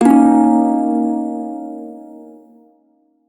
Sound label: Prime Shawzin Yo Chord, Music; Warframe